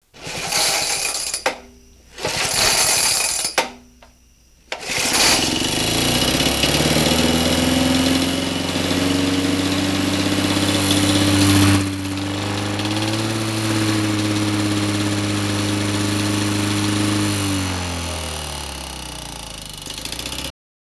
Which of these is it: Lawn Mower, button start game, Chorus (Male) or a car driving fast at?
Lawn Mower